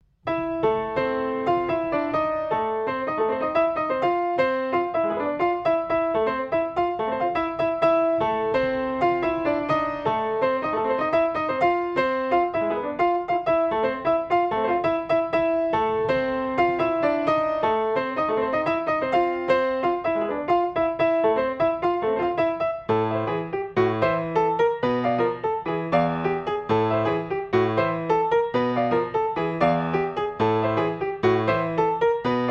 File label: mambo alesis